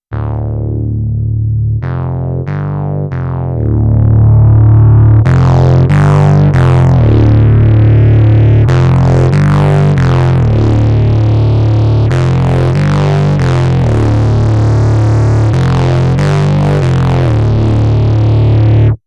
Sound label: Korg, Analog Roar; Corrosion